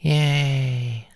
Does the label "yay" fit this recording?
Yes